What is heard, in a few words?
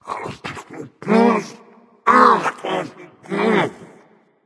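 nonverbal grumble; smite; voicepack; merlin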